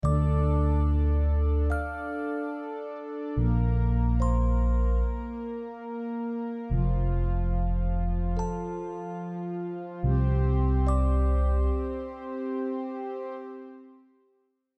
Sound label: ambient loop, music